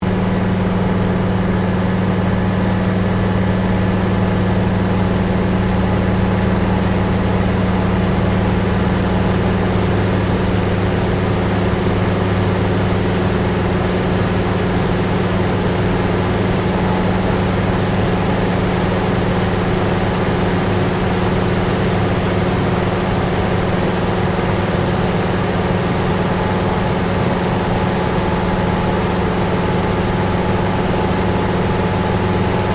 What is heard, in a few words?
AIRCRAFT CA-13 BOOMERANG FLYING INTERIOR STEREO; Airplane Sound Effects; Vehicles